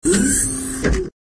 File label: robotz restore up, windows sound schemes; windows 9x